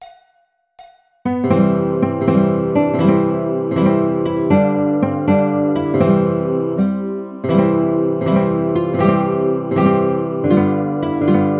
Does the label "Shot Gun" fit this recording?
No